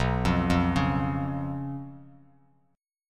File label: B Suspended 2nd Flat 5th B Bass Standard; arpeggiated; JGuitar